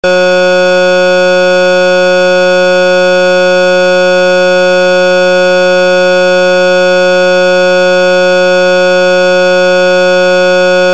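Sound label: Siren Tone ALARM (RUMBLER); UNITROL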